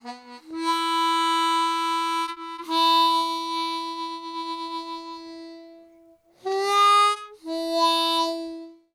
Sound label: Harp Excerpt